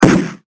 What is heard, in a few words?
bang